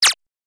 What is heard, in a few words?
lazer